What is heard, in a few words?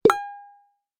Mine; Google Snake; Minesweeper